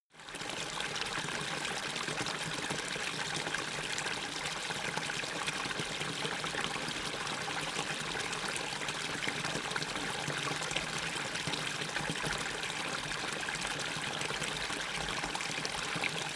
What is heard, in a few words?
savanna dam overflow